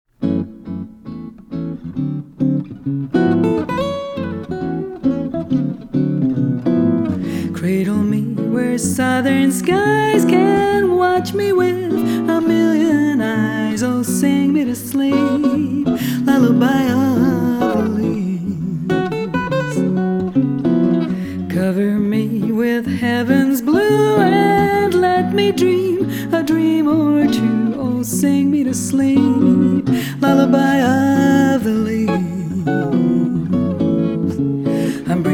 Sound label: Lullaby